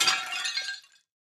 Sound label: Break Vase; Boomerang Fu